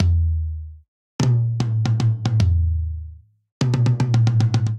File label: Swing Waltz Toms Only 3/4 150 bpm; MIDI Clips; Ableton; Factory Packs; Drum Booth